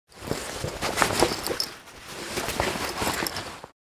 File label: School bag backpack handling movement; luggage